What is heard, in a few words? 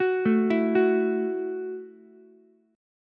Gb Minor 7th Gb down Ukulele; Gbm7 arpeggiated; JGuitar